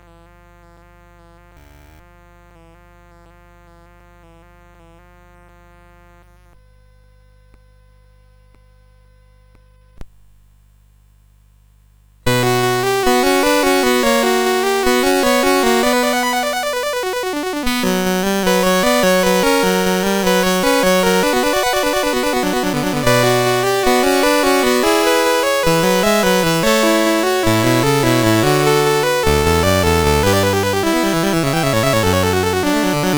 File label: Invention in B Minor, CSG8580R5